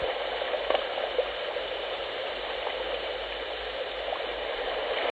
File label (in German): Wellen